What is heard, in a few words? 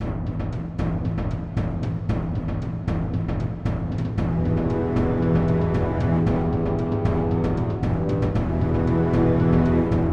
loading music